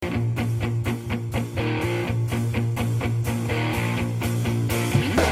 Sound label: Game Tune